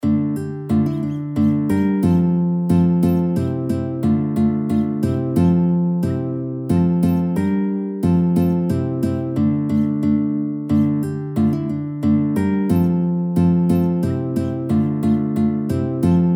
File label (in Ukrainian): BANDURKA; Otamane batku nash